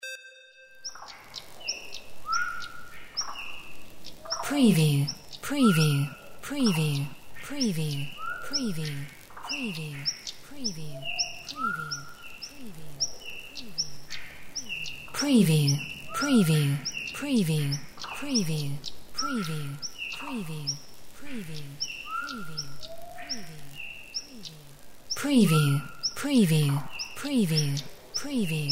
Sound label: AMB JUNGLE INTENSE